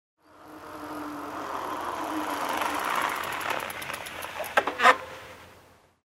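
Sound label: approaching bicycle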